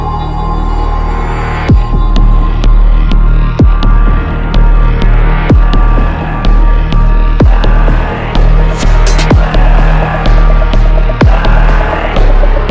intro music

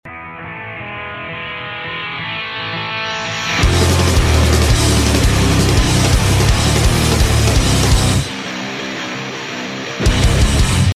intro music

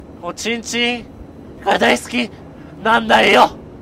win; freak fortress 2

